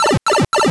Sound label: pipe; mario